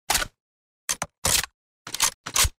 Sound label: sr25 reload; weapons